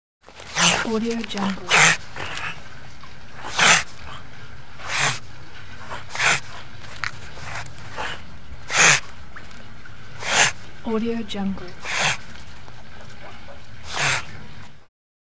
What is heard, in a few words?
river otter snarls